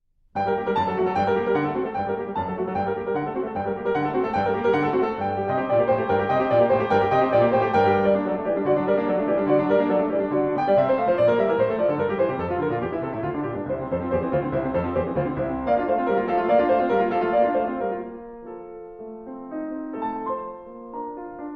Keyboard Sonata in G Minor

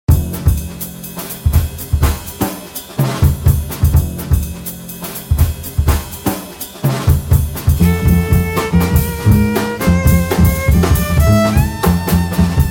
Violin Trio

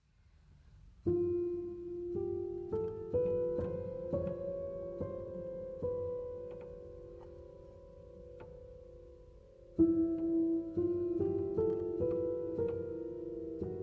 Upright Piano

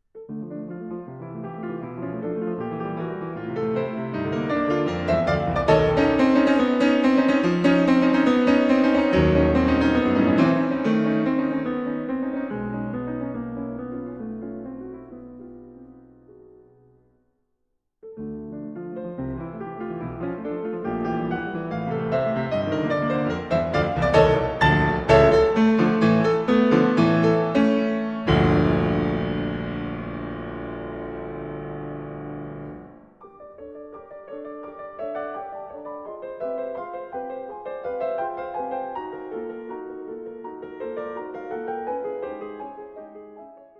Piano Sonata in D minor, Allegro, ma non troppo

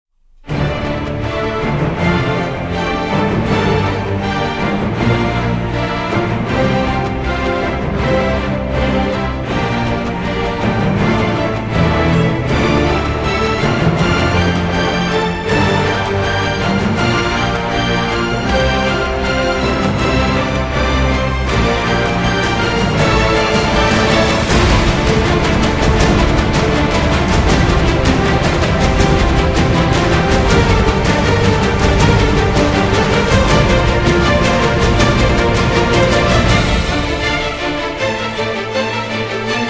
Instrumental

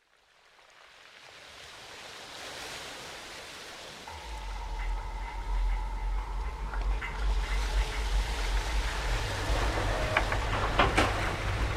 Instrumental Version

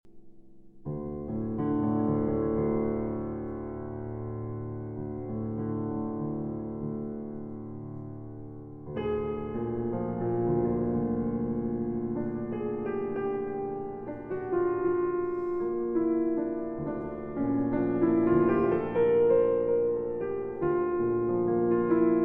Piano Solo, Barcarole; Three Pieces For Piano Solo, Op. 204 III.

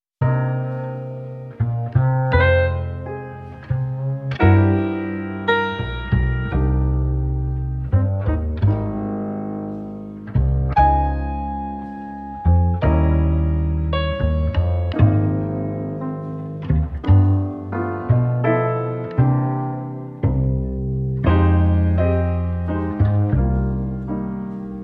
In C Minor, Jazz Ensemble

